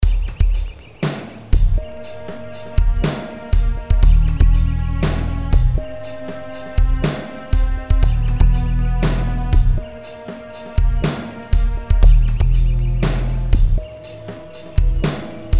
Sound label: loop rhythm sinus air orch; songsnacks